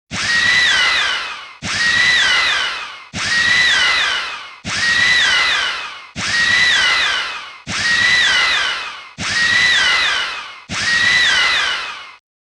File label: Warning; Darius 30th Anniversary Box